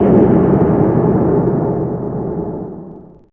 Percu; PCGamer50

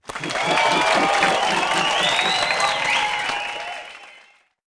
CHEER, petitioners

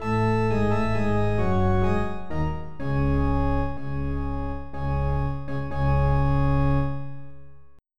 lose

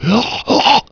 choke; PC Gamer IT CD 41; Daikatana; superfly

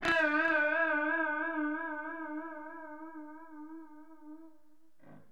GUIT LFO; LiveAct Pool

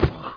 player landing; Daikatana

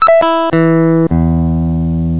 les paul

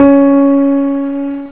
piano, pianos

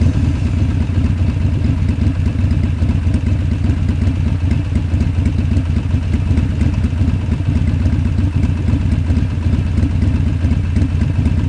Car; SERennen

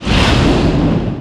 Afterburn; Team17; Phoenix Demo

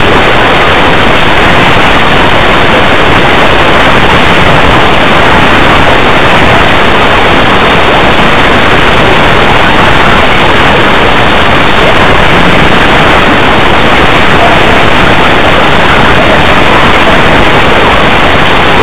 swish; Blue Heat

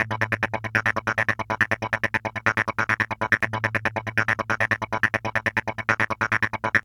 synth; flash tutorial

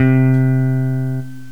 jazz guitar

